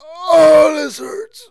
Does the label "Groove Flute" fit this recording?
No